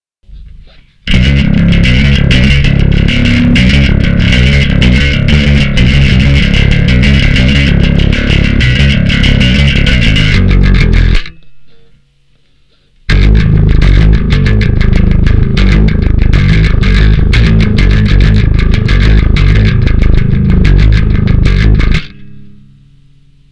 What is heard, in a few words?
riff